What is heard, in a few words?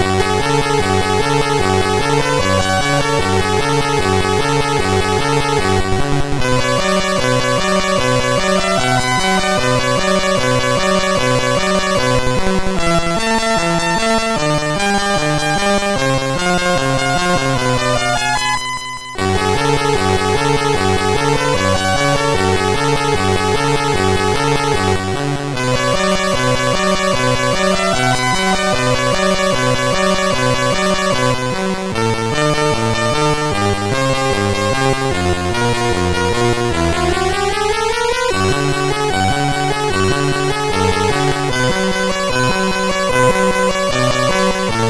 Laserwheel sid MOS6581R4